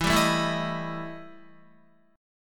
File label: E Minor Major 7th Double Flat 5th E Guitar; strummed; JGuitar